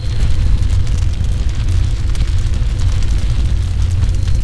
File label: fire barrel loop; Dystopia